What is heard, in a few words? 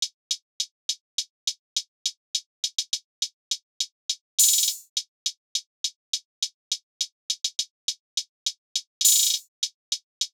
103 hh loop rnb trap